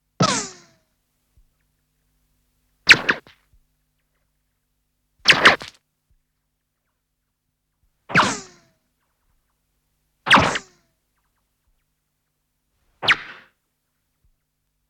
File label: Ricochets